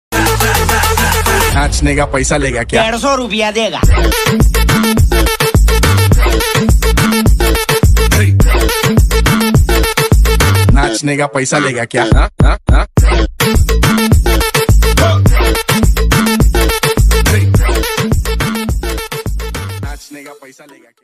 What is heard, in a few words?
edm drop ringtone; 150 rupya dega